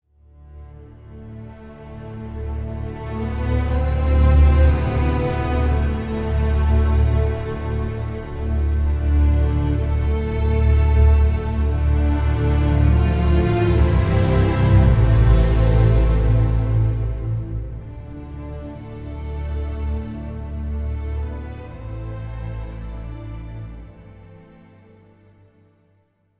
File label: Stinger Main Theme; Global Conversation 33